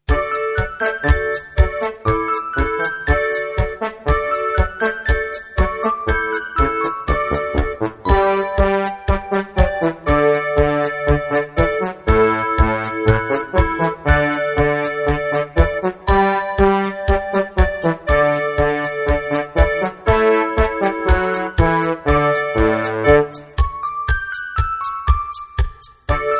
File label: A E I O U (Instrumental); Abrapalabra La Magia de Aprender a Leer